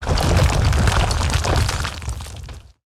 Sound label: Rock Movement Underlay Death